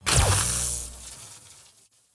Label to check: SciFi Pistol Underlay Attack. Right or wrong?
Right